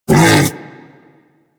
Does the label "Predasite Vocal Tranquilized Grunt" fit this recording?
Yes